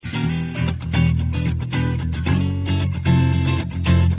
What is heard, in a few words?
funky